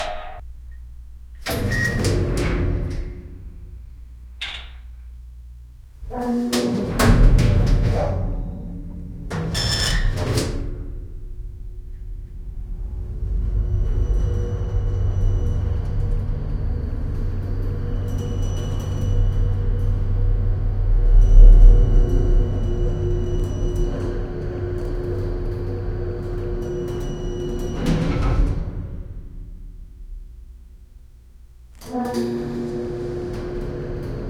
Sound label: eerie sound of an old, elevator